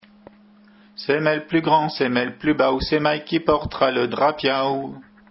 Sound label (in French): Chant Gallo, Chant à Répondre